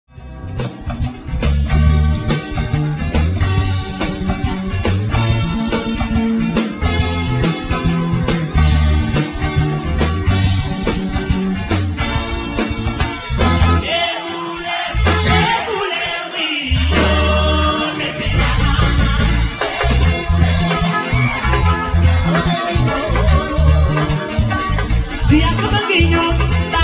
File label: diapason